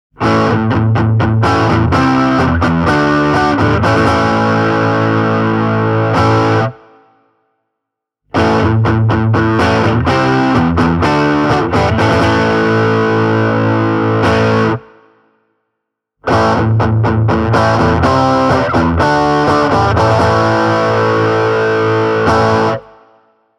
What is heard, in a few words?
Italia Mondial Classic overdrive; puoliakustinen sähkökitara